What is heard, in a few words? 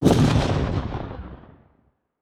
kaiju lightning hit lightning crack; Stellaris; biogenesis; weapons